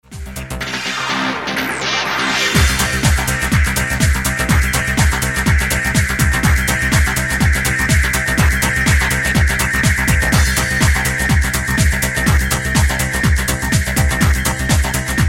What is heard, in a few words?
Electric Guitar